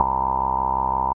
filtery synth bass

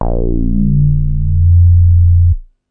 tight square A2, Tight Square Bass

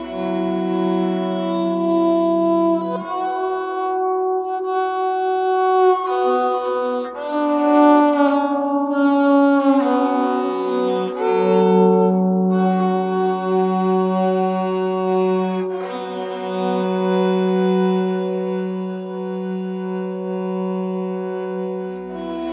string abstract melody; ORCHESTRAL and CLASSICAL

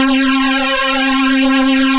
Synthesizer Middle C Notes, Lead

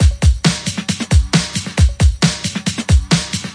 135 bpm, lifebeat, drum loops and breaks

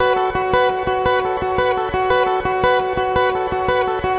114 infinite happy guitar triplets; GUITAR LOOPS

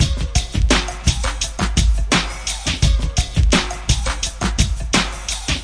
085 mellow bounce full

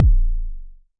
Jomox MBase, drum machine; Jomox MBase 01

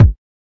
kik

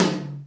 REVTOM H, Kawai R50; DRUM MACHINES

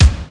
gate kick; Kicks; single hits

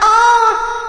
ohh verb, FEMALE; FEMALE MISC and SPOKEN WORD

